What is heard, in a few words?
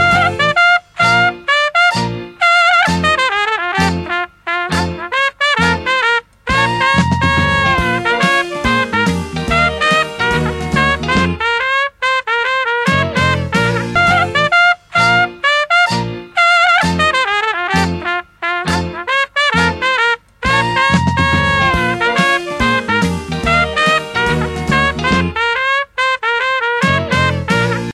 old jazz 1930